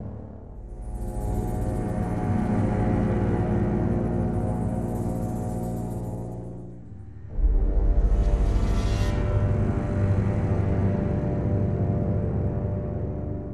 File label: AMB SPACE LP; transformers war for cybertron gamerip